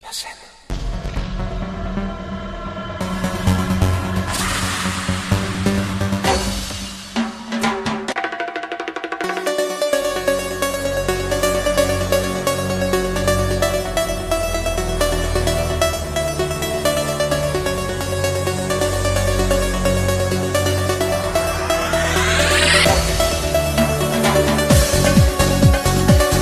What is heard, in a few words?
Greek Clubbin